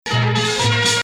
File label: Nahawand, maqam; Rast